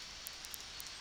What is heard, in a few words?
Background Noise